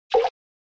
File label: SNES Golf Ball Water